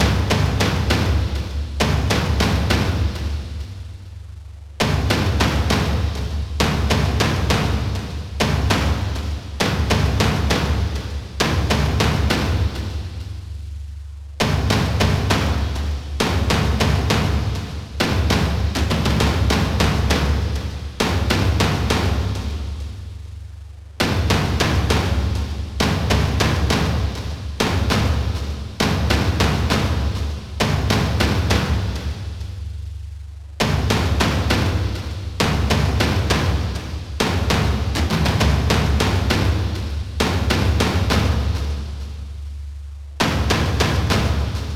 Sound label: boss layer perc; chapter3 boss layer4 perc